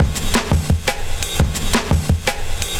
Frantique, Drum Loops, Compressed; Zero-G Smoke

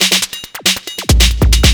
drum 138, drum-loops, 2bass, Techno

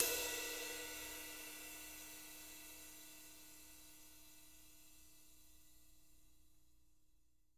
rides

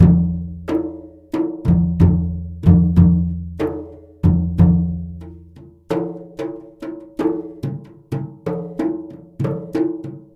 MLY DRMS; Spectrasonics - Heart of Asia CD2

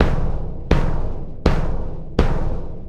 Big Drum Four, Drums; Sonic Foundry (Sony Creative Software); Essential Percussion; Big Drum Combos

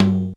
LO TOM; Crypton - DrumBase5000

